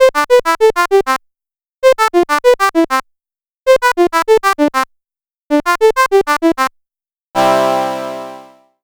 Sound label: silly saw; Whittington